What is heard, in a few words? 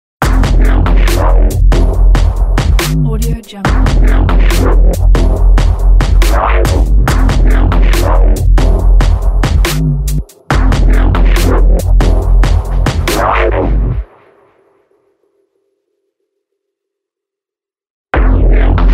dubstep logo